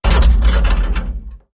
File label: sound wheel; Wheel Race